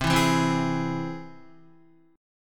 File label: C Augmented, Guitar, chord; strummed; 7,7,4,5,4,4; 4F,4A,5C,5G,5C,6E; JGuitar